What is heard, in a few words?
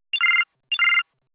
Basic Double, Business Rings